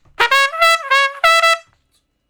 Trumpet Shuffle (Db); Crimson Blue and Fabulous Horncraft 4 RnB; Horncraft for R&B; Solos

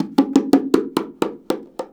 Percussion Loops, Bongo & Conga; Houseworx

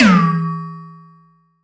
Perc

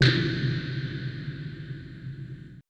AMBI FX

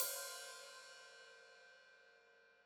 Hat Open, Hi-Hat; Deeply Sampled Drums

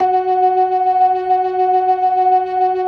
LO MANUAL VIB, Organ

